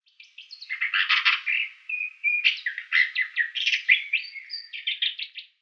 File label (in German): Isabellwuerger Lanius isabellinus; Vogelstimmen